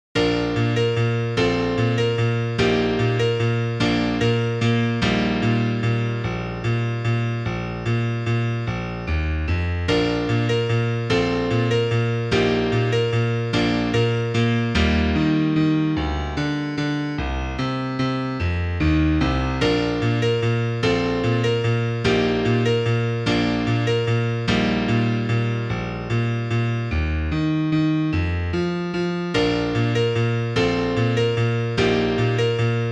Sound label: Quia fecit mihi magna Piano; capella; magnificat